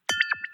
ting dual channel 48; tenfourfox; webaudio

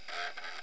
Search Skip Search; activegs ios

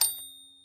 tty bell; 8bitworkshop

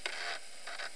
Search Skip Search; activegs ios

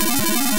scorpion; BuGS; Apple 2gs

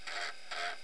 Search Skip Search; activegs ios

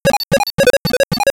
spider; BuGS; Apple 2gs

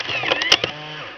floppy eject; activegs ios

